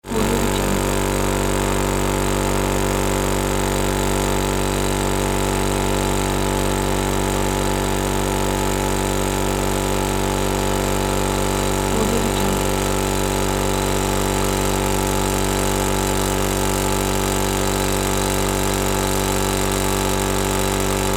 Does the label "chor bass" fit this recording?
No